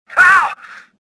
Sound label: pain; Star Wars Jedi Knight II Jedi Outcast; XBOX; stofficer